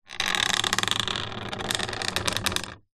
household lamp wall mounted metal bendy arm movement bend creak; zapsplat